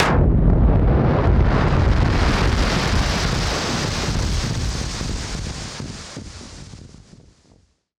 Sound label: Noise Bomb Harmon; Noise Bombs; musicradar; bomb factory